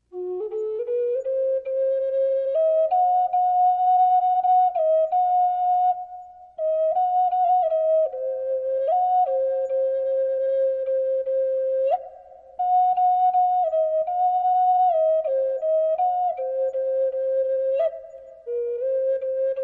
Sleep Therapy, Flute for Sleep & Relaxation with Sounds of Nature